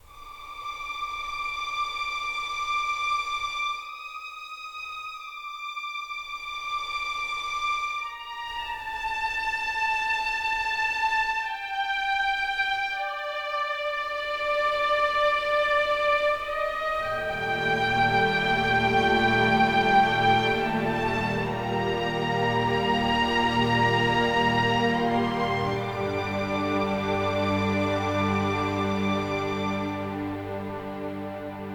Old Houseboat - Loop, Strings Stem; ps4 ps5 switch windows xbox one xbox series xs

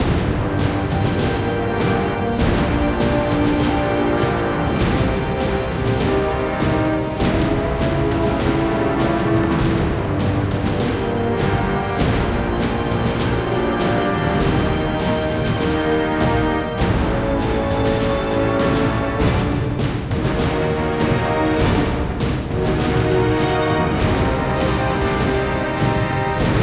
Battle Normal

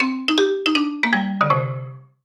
Solo Xylophone Negative; MUSIC EFFECT; startide

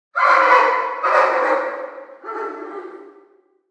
Dog Chase Target Distant; Prison Architect

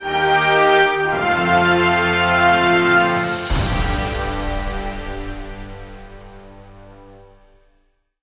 Quest Complete Sting

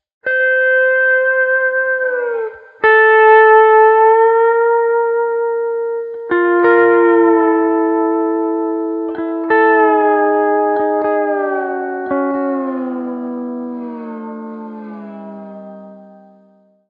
G steel

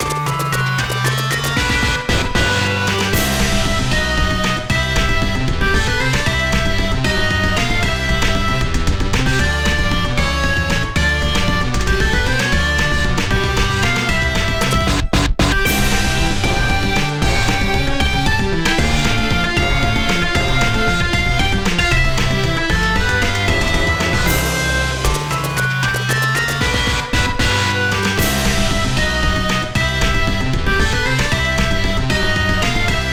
Underwater; paper mario the thousand year door complete; Super Bowser Bros.; GameCube version

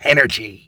bon energy; pirates of the atlantic windows gamerip 2006

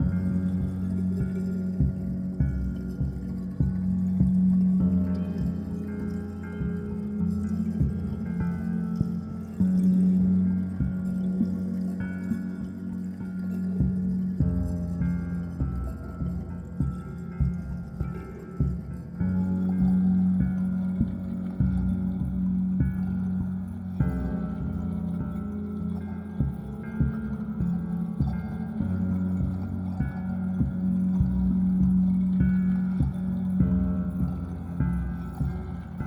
Adventure Loop T50 4-4; Mistover

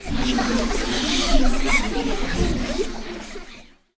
kids whishper, French

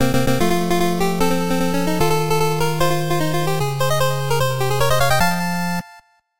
Jingle Get; Chinki Get; Dandy Dungeon Legend of Brave Yamada

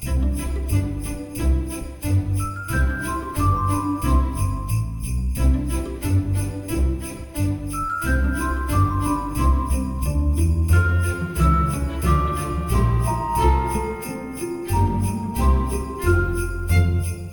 Outside Normal; Delicious Emily's Holiday Season

